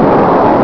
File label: FIRE